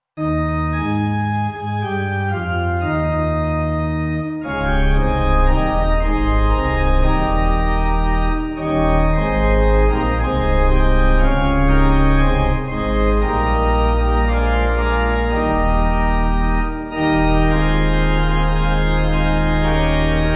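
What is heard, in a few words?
Pipe, Pipe Organ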